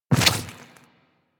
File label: Small Land Default, Infested Footsteps; Warframe